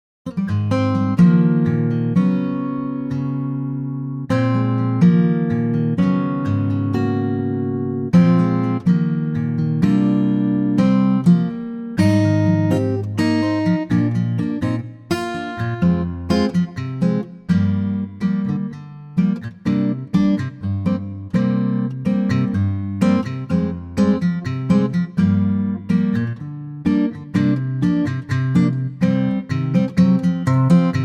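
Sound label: Eb acoustic guitar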